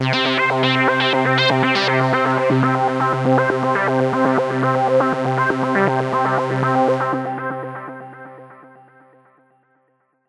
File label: tecno